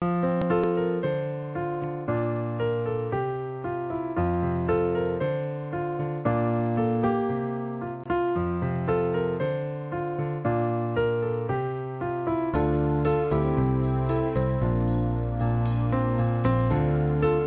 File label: cisco piano